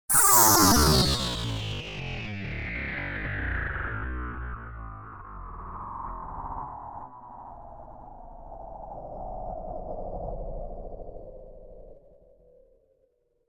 Space Ship By - Buzzing - Turn Of The Engine - Long; Hollywood Edge; sound designer's toolkit v2